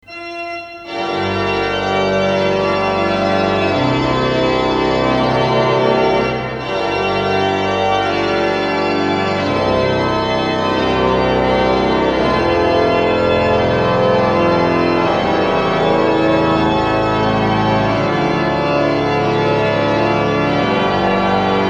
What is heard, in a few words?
CHORAL FRAGMENT, organ